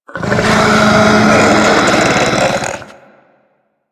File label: Infested Ancient Idle, Enemies; Warframe